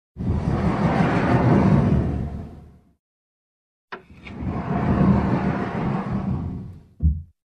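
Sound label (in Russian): Dver kupe, train compartment door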